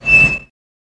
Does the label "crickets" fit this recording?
No